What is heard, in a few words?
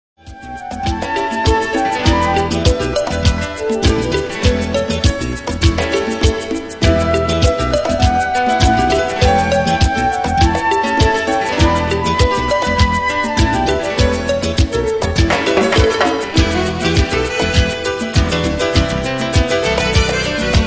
Marimba; italian afro